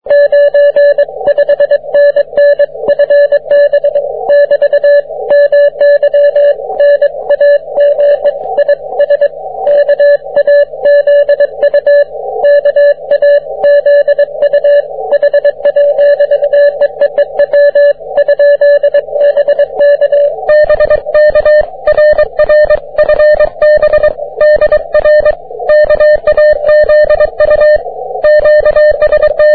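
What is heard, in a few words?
30m continuous wave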